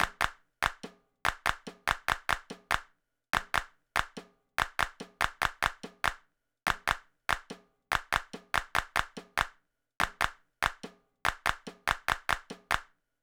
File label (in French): rythme